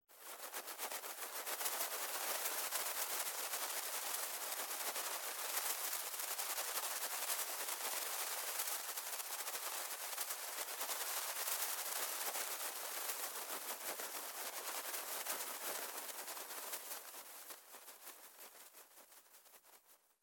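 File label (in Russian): several butterflies fluttering in a cramped room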